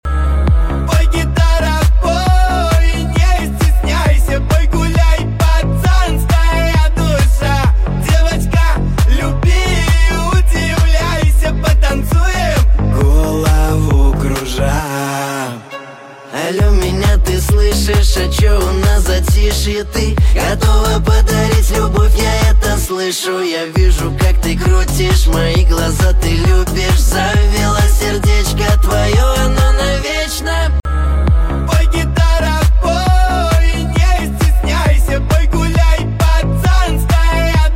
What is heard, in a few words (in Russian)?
Пой гитара